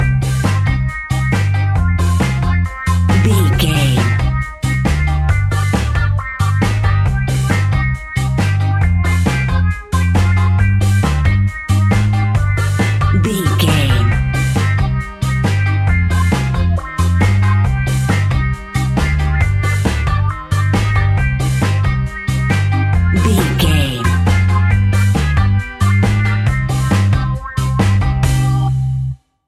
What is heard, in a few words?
cool ska reggae carribean music, Classic reggae music with that skank bounce reggae feeling